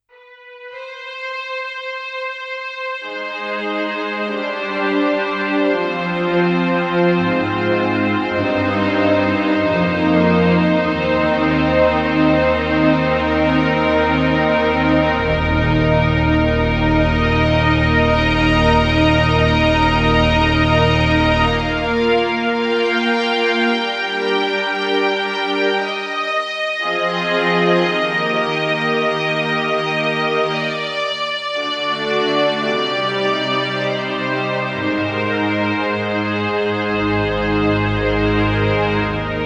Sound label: Strings